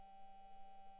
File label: mic, mars2020 edlcam